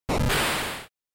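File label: Game Boy RPG Encounter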